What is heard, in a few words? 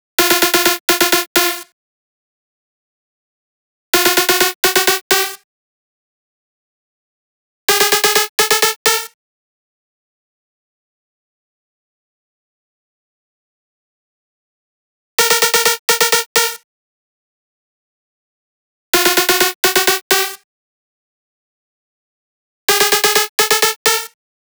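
128BPM Drop Lead dry NoSC, Synth, F minor; Vengeance Essential Clubsounds Vol.5; VEC5 Demo Constr Kits; Mainstage Kit; Root Fmin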